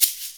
SHK, Shaker; Univers Sons; Basicussions

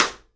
SIDE STICK, Live Snares

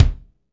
KIK FNK, Funk Kits, Roland; Northstar - Drumscapes Roland

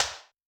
Electrik, Yamaha DTXpress-II snare

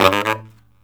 Killer Brass Riffs

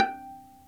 P VIOLA G5; SOLO PIZZ ST; Greytsounds VOL-1

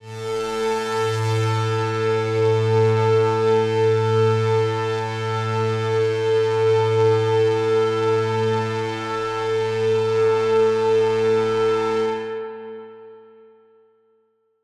One Shot Drone A, dystopian drone; One-Shot Drones

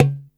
tabla dom, Yamaha Motif ES drum machine; 300 Drum Machines; Yamaha Motif ES-Series

